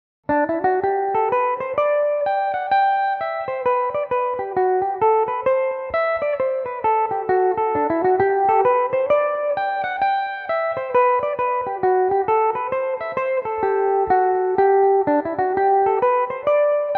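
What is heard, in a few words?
G major belmuse, irish mandolin; Belmuse EM-190 electric mandolin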